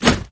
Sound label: eagle hit; CrossyRoad